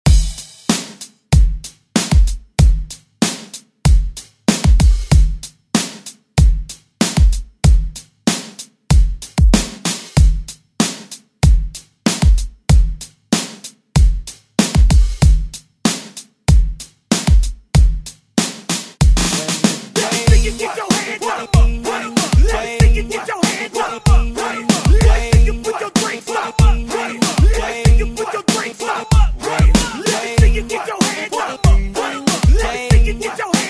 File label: Party Hype Redrum; Deejay Hit